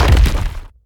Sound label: medium platform stop; Scrat